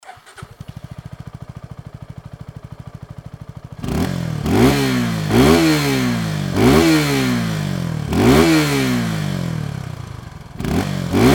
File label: gixxer sf250 normal; ed22b